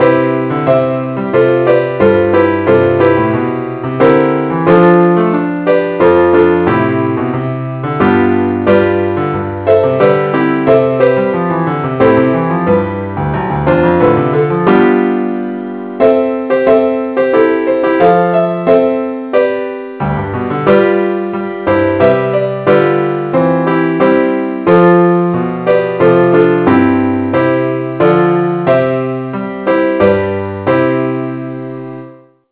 keyboard only key C

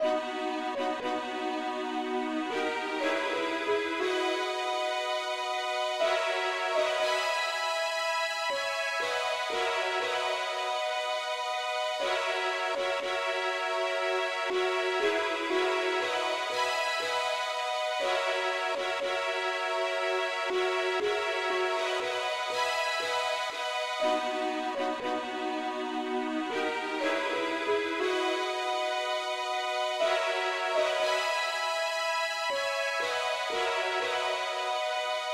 uke lead, key b

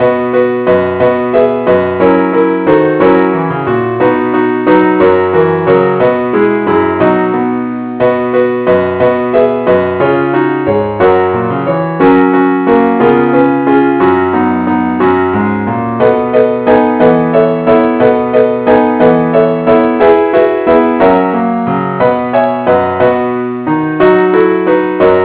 keyboard only key B

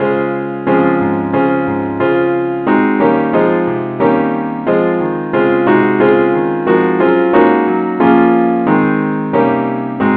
keyboard key des; saintthomas

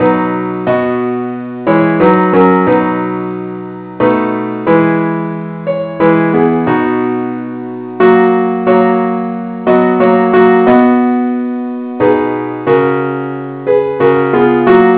partsonly keyb; i need thee

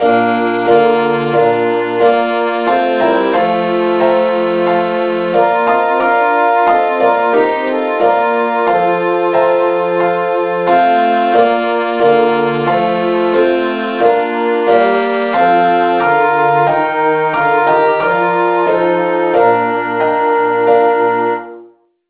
satb key bes; saintthomas

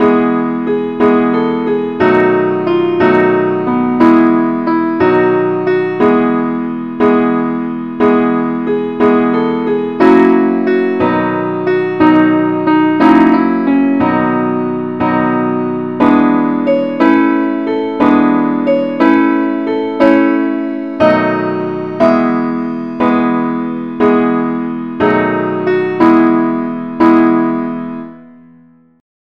simpleacc key e; angelvoices

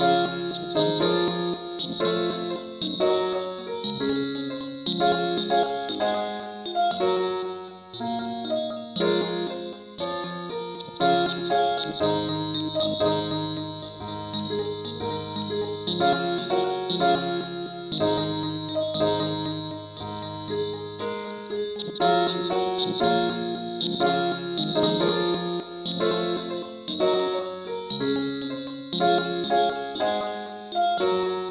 ukelele key cis

